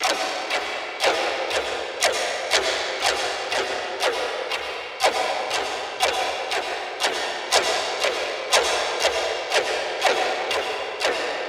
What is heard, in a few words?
clock second hand